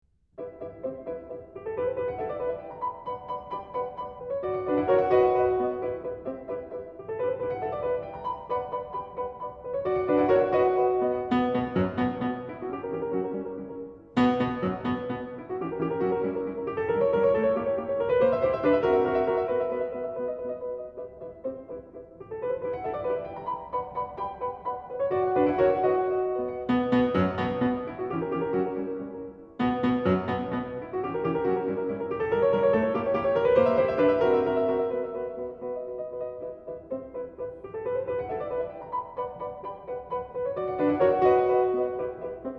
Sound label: Fairy-dance, piano; Grieg; Lyric Pieces, Opus 12